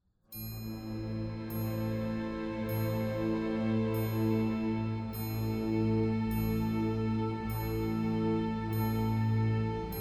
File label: piano